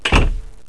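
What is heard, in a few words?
door close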